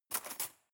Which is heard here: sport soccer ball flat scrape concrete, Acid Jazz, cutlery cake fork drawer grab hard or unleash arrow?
cutlery cake fork drawer grab hard